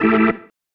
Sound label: Stab, Organ, One Shots